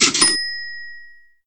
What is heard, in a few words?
cash Register